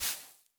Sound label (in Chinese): 刷子刷沙子声